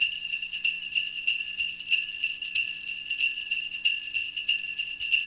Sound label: BELLS; East West